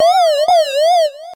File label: Bloupy